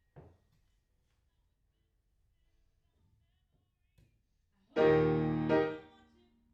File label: Piano Room